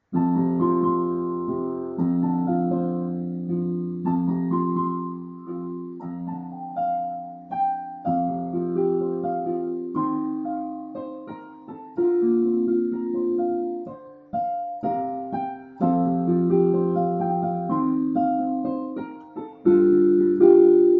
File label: Piano